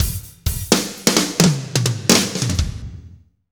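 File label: slow shuffle, Roland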